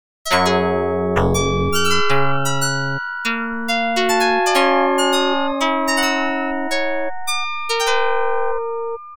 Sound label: melody